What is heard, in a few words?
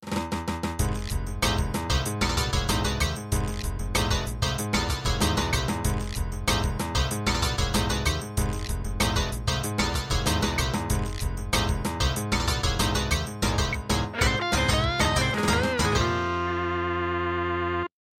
MIDI Ending